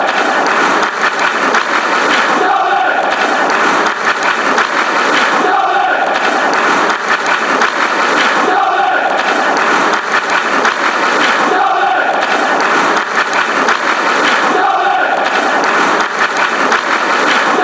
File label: horn derby